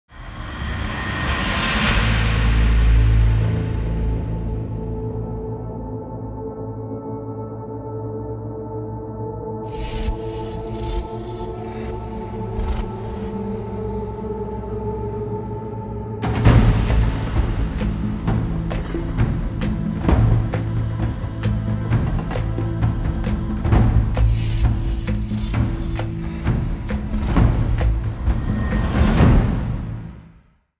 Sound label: MINOTAUR, impact percussion